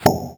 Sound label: Pop